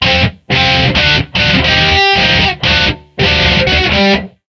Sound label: Guitar biff blues wank; Back to the Future: The Game; It's About Time